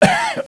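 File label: cough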